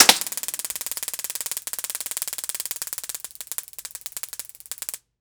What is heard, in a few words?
Weapon Gun Taser Gun Shot At Foil Target; AdobeCC